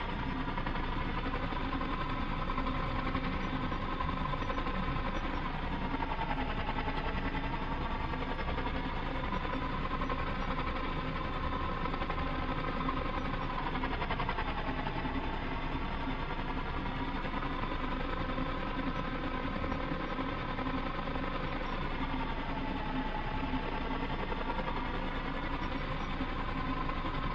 Hover Idling; bakemono demon brigade tenmen unit 01

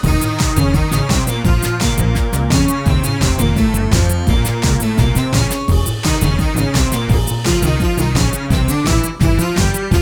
Combat Med loop; GraveyardOps

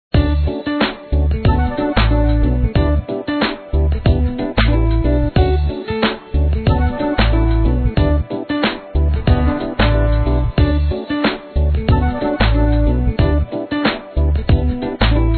Menu loop; Raft Wars

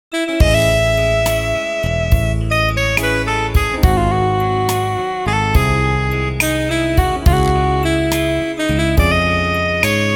Passionate Sax

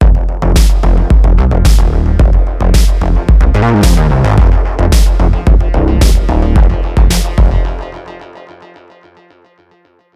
Stinger

